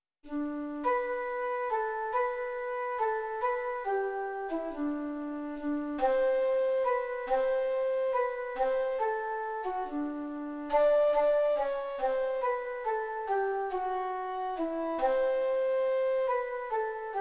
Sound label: wangaratta waltz